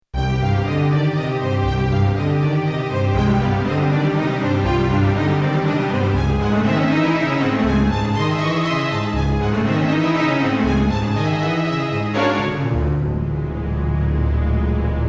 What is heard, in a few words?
Strings Arco; xp-80; roland; roland demo cd-3